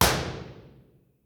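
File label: impulse response stereo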